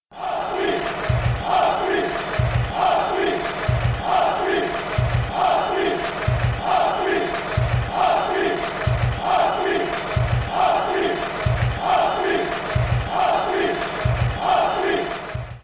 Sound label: swiss football chant hopp schwiiz